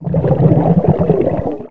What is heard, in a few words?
player swim; xash3d fwgs